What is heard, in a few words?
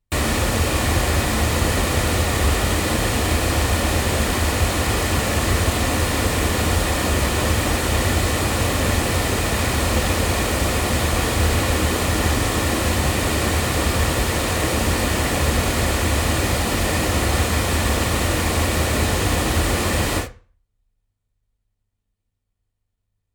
Oktava MKL-2500 loudspeakers